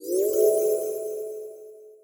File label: divine ab